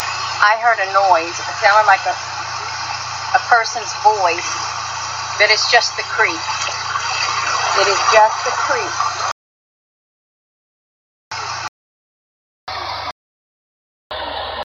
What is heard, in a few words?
Computer generated sound being heard here